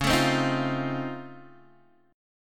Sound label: C sharp Augmented Major 9th, C sharp, down, Guitar, DADGAD